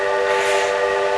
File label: steam pipe; Crazy Machines; Играет вся семья